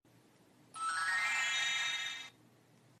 魔法小精灵, magic

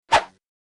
咻-转场

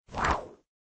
风吹过-转场

